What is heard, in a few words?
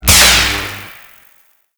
Robot Laser Activate, Shipyards; Ambience; Warframe; Grineer Shipyards